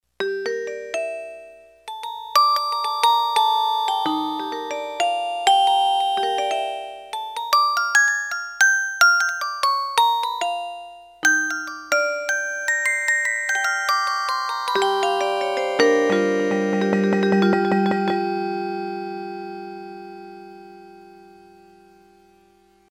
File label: GLOCKEN, Yamaha PSR-300; Voices